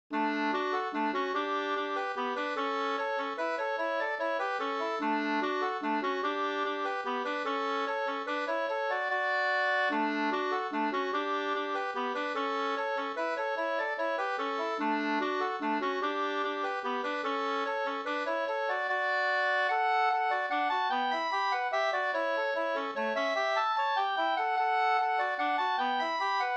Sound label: mazurka; e Albert Lantz Sanne Bh 2st